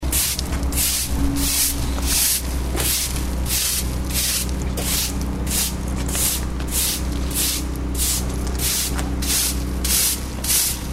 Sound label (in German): besen kehrt auf baustelle